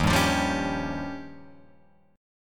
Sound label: Eb 9th, Guitar, Open F; strummed; 3,2,5,2,4,2; JGuitar